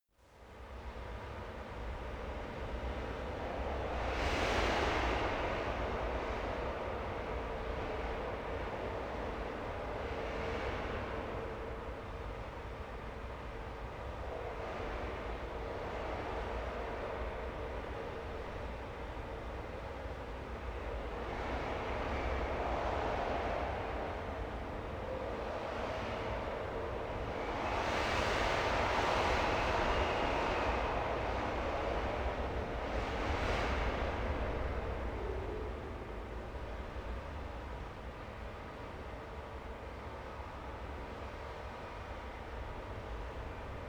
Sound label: ambiance wind